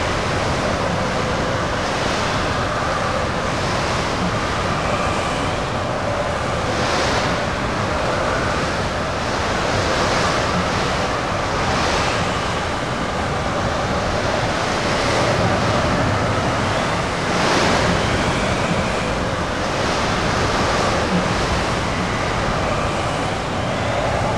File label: Heavy Wind looped; Academy; Valthirian Arc: Hero School Story 2